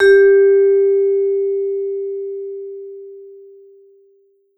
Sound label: Vibes, G3; Sampleheads - Dave Samuels Marimba & Vibes